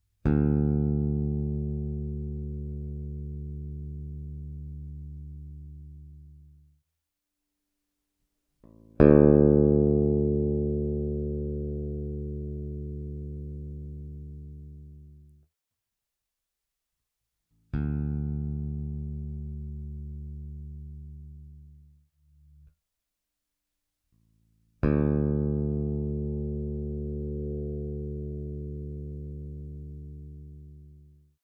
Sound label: Warwick Gnome i Pro V2 Mid EQ